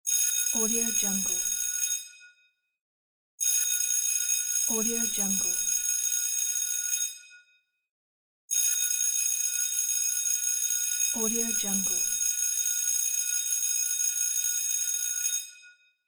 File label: school bell